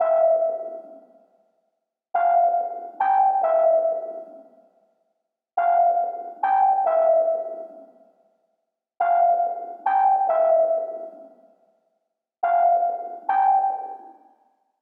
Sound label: 140 BPM E Min ARP2600 Pluck, Synth; Loops; Loop Stems; Cymatics; Fantasy Synth Sample Pack; Heat; ARP+JU60+P5